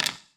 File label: surface wood tray, dice; darkwatch